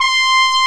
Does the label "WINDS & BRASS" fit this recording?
Yes